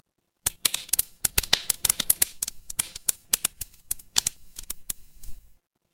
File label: fire electric crackle